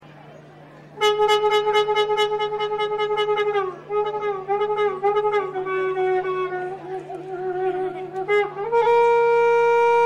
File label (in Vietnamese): Tieng thoi tu va